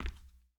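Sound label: Foot Hard Wood Sneaker Walk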